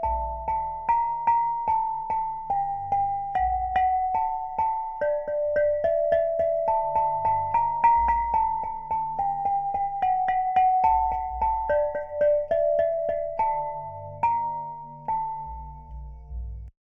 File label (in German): Glas vibe